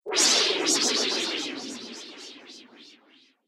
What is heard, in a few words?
Science Fiction Laser Shooting, laser gun